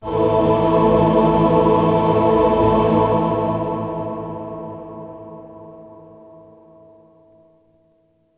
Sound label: gameover, pinball; Excalibur